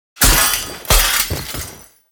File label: Stealth Hit Foley; Enemies; Warframe; Duviri; Dax